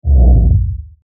Sweeper Fast Bass Rumble, Sweeper Flange Dark Descend; Production Element Imaging Element Accent Transition; Sweeper Sound Effects